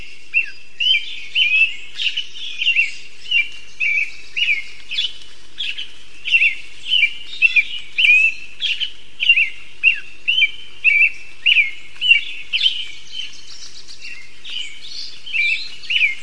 American Robin, bird songs of WA calls

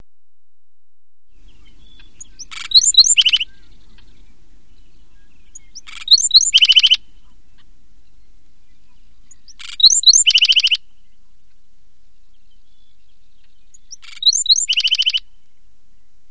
black-throated sparrow; bird songs of WA calls

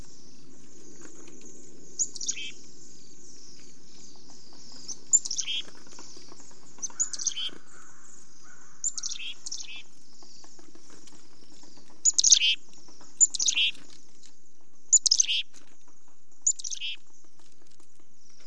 bc chickadee; new york

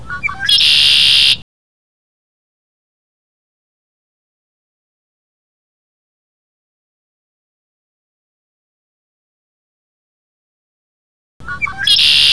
bird songs of WA calls; rwbb 2

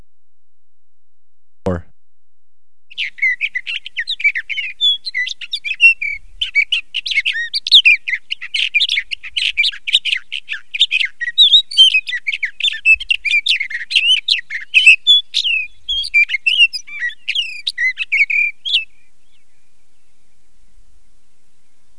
sage thrasher; bird songs of WA; calls

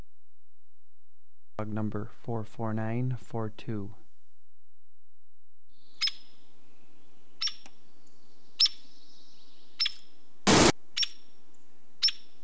Hairy Woodpecker

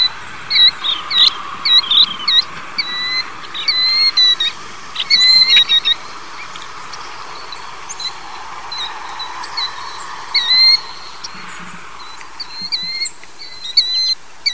killdeer; bird songs of WA calls

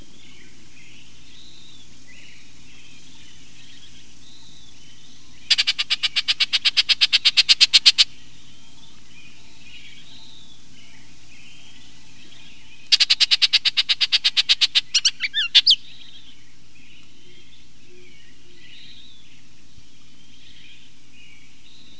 Bullock's oriole, bird songs of WA calls

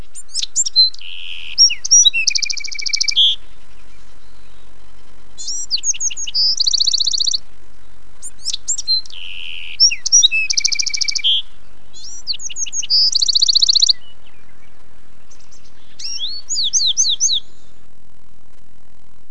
Bewick's wren, bird songs of WA calls

